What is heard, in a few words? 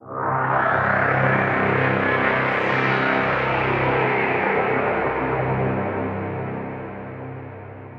Transmission Drone, Pad; Instruments; Ableton; Drone Lab